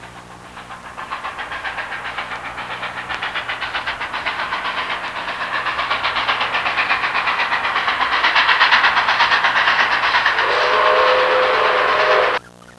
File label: TRAIN; Transportation